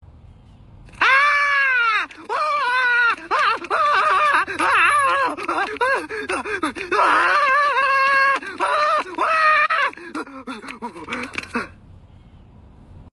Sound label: Ahhhh